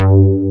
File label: acid bass